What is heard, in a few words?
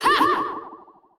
Enemy Boss Attack Light; bloom minigame